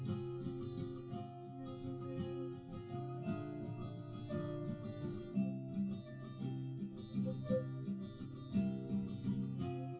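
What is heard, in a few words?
no drums, live recording 250ms 1m; SiSEC11; underdetermined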